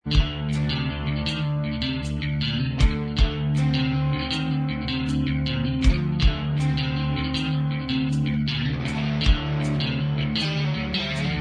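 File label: Inst Track, instrumental track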